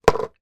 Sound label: fast food drink cup ice inside empty down on table